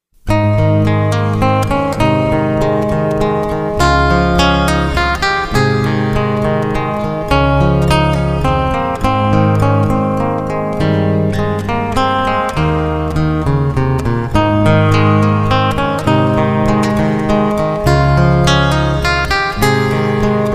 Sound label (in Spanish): minueto, guitarra; bach